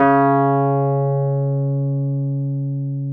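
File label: Wurlitzer electric piano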